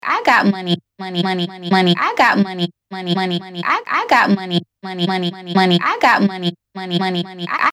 VOX LOOP 125BPM; Volac

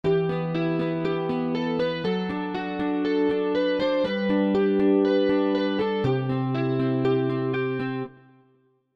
Mantis Em 140, Folk MIDI Melody

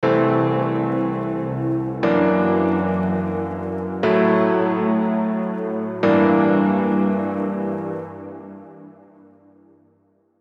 Lydian Dominant Prog; Unison Lydian Dominant Advanced Scales MIDI Collection

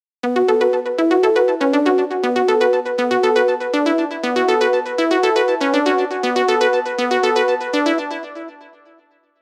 Lead, Deep House, Serum; Unison Deep House Collection for Serum

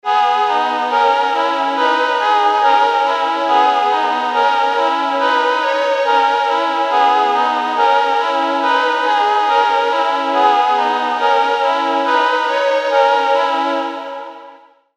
G#m 140, UK Drill & UK Grime MIDI Melody; Next; Unison UK Drill & UK Grime MIDI Melody Collection

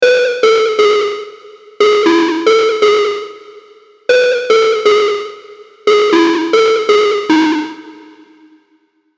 Pluck; Freak; Unison Mainstage One-Shot Collection

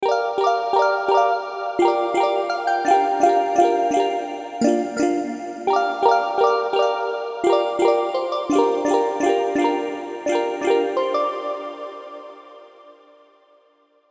Plink 170bpm; Unison Major Pentatonic Advanced Scales MIDI Collection

